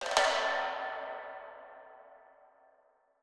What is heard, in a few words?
percussion, percusson samples; RockScience